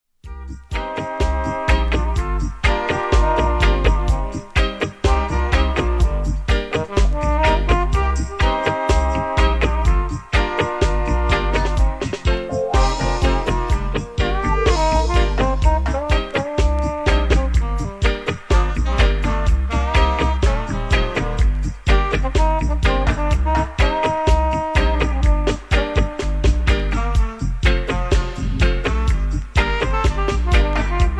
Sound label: blazing horns